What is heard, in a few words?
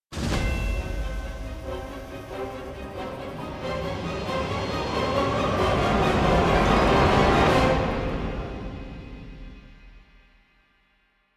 STING DRAGON; KUNLUN